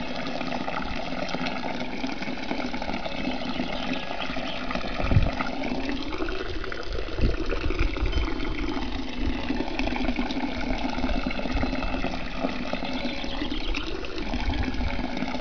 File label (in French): fontaine